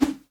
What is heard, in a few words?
SWORD